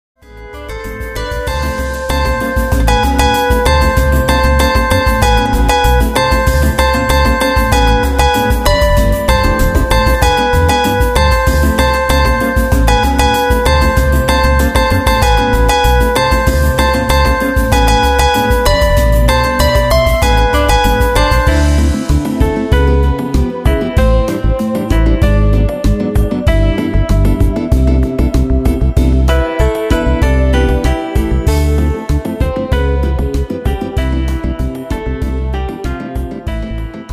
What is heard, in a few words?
Bossa Nova